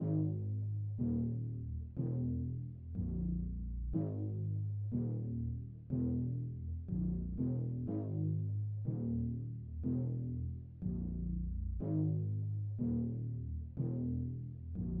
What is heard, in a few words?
122 BPM G# Min Bass; Cymatics; 2022 Melody USB Expansion; Class